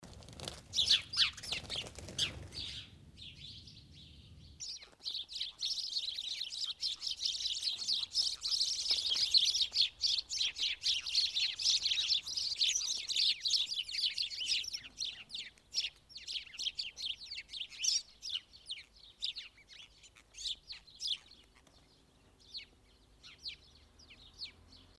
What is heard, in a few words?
fluttering sparrows twittering and scolding